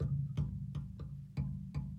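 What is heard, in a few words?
Calm Far, music; from dust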